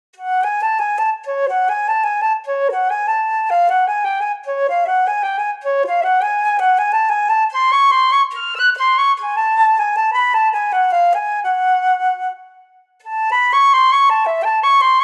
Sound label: FLUTE